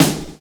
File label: SD, snare, 70 s Breakbeats